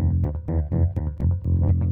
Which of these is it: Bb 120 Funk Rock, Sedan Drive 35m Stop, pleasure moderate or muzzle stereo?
Bb 120 Funk Rock